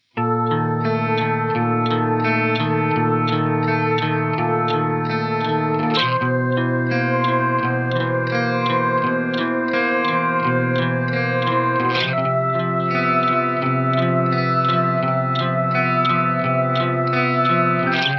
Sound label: Sample guitar stereo